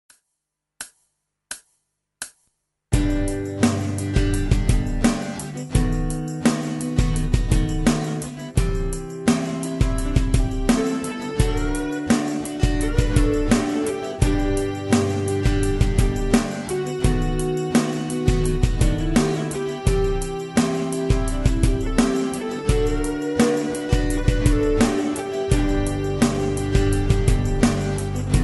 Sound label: bass